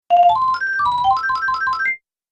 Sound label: Super Bonus Points, video game